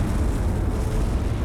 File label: crash rocket boost